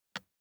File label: DICE on Glass, Grab, Standard, One Die; Samples; ArticulatedSounds; DICE Sfx Party (Extended)